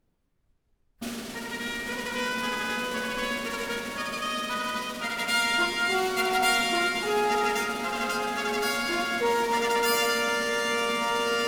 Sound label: Fanfare No. 1; Incidental Music to the Tragedy 'King Lear' by W. Shakespeare, Op. 58a; DG